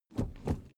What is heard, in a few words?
suv idle 1st gear change hard; verkehr transport; autos